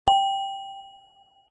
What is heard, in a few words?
ding